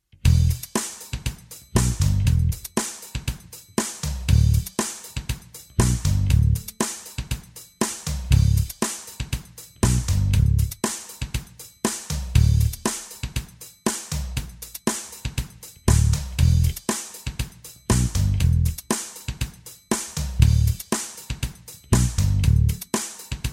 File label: dirge, Electric Bass